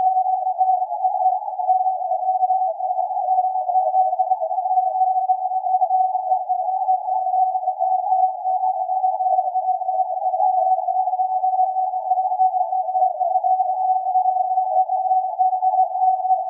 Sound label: CW carrier 750Hz random noise; Diversity Stereo NR